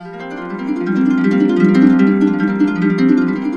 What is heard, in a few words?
F, Harps; Complete Harps; USB Soundscan vol.62; AKAI